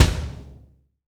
MIX BD, AKAI S6000, DRUM RYTHM; AKAI S6000 CD-ROM - Volume 1

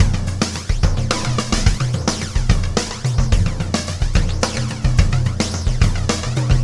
Oscillation Beat

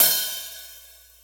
open hh, hihats

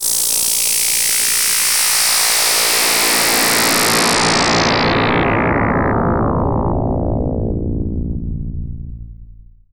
DISRUPT, ATMOS; AKAI Anime Sound FX

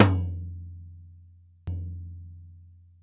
medium, sticks; PAUK; SoundBytes - Early Patches

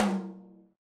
RX8 Tom, Yamaha RX-8

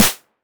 Clap Pairs

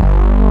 D SAW BASS; Pro-Rec - Synthsonic; TRINITY

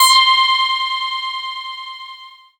METLB, synthesizer bass; Synthesizers; Soli-Music; World of Synthesizers VOL-1

